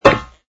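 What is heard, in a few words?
footstep steel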